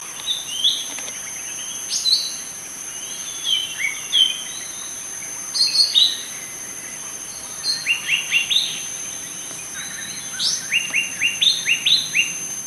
Blue winged Leafbird adult male foraging calls Kledang Sayong Forest Reserve Ipoh Perak; Malaysia